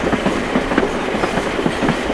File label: Miniature Train Running